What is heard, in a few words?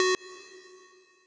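square lead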